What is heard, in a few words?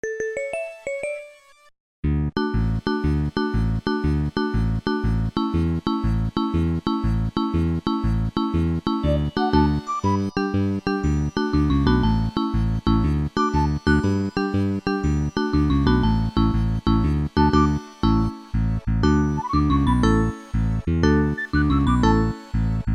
Sega Genesis Insturmental; The Ren & Stimpy Show; Original Log Commercial; SupremeMIDI